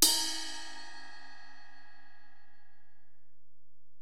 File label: Ride, Alesis DM-PRO